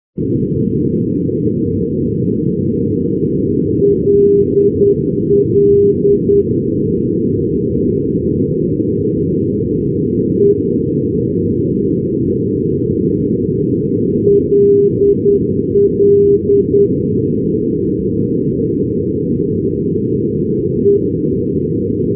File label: ndb lille ll(e) 332; paris; europe